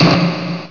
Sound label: BANG